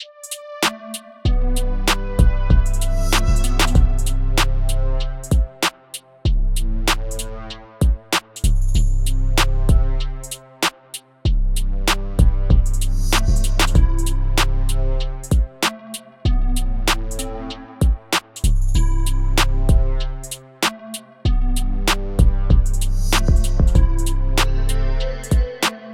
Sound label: Sparkle C major 96bpm